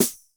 Korg 01W MegaDrums; 300 Drum Machines

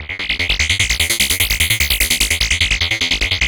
Analog Rhythm

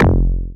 bass F1, Casio VZ10M; Polyend; Simulacrum Sounds; 1 Shots; Synths

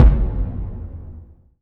bass drum; Zero-G - Chemical Beats; BASSDRUM ZOO

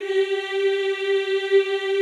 EEH G3, Classical Choir; Best Service; Extended Classical Choir

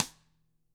DW STK D-R, DRUMS SNRD; ILIO; Double Platinum Drums 2

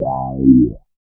BAS WOW; East West - Phat & Phunky CD2; 90's Sample CDs

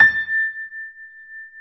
GRANDP ST A 5 F; USB Soundscan vol.17

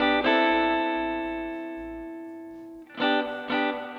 Guitar, 70s Analog Funk

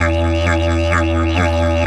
E 130, didgeridoo

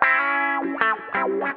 GUITAR

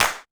Percussion, Korg 01W; 300 Drum Machines

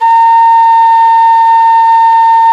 QUE A 5, quena; SoundBytes - Early Patches

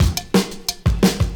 Bell Steppa 175, Drum&Bass Breaks; EZ Rollers

